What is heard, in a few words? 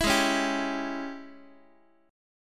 G sharp Major 7th Suspended 4th Sharp 5th, Guitar; x,x,7,6,5,6; JGuitar